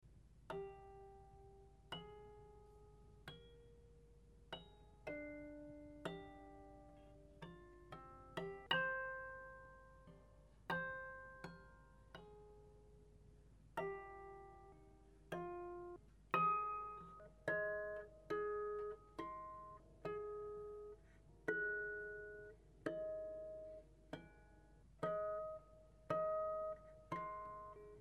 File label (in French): harmo, harpe; naturel